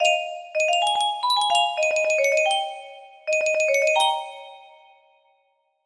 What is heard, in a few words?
lil funky tune